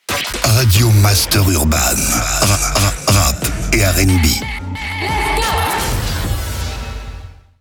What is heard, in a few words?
JINGLE; RADIO MASTER URBAN; Habillage